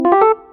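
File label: net charge positive; build-an-atom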